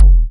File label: Click